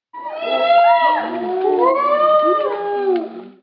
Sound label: chimpanzee; sounds of the wild